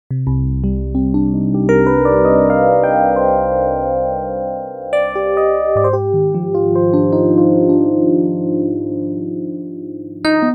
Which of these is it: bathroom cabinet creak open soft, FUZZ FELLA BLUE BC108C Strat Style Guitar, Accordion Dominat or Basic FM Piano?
Basic FM Piano